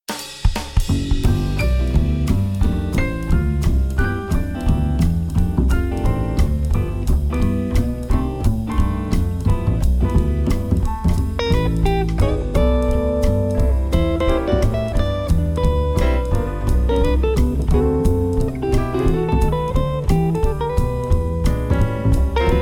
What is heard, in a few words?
Jazz Instrumentals; Desayunos